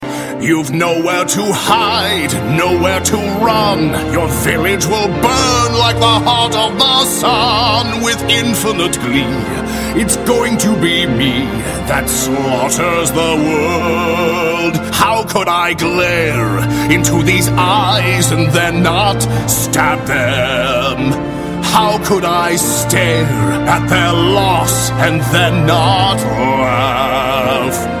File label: metal; rock